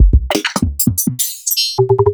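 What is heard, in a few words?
Roland MV8800 drum hip kit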